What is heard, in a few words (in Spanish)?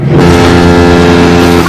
alarm despertador; bob esponja